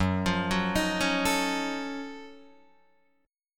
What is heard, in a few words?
F sharp Minor Major 13th, Guitar DADGAD; chordshape; down